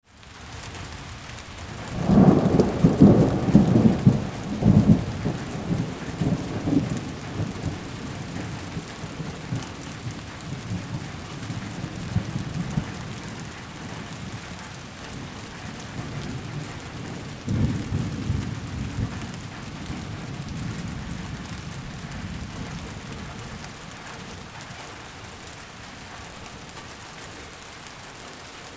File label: Sound of rain storm